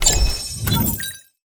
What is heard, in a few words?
ui banner exchange